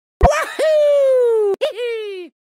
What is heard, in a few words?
wahoo hehe